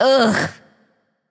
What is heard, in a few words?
ugh